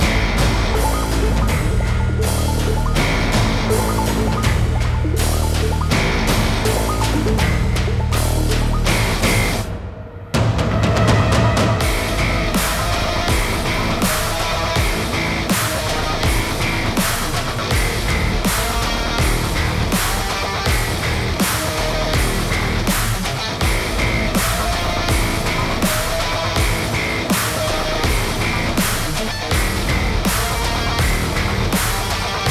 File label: BGM battle boss